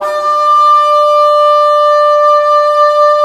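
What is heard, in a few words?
WND BN, wind bassoon; LA Composer vol. 3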